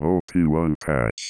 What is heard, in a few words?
drum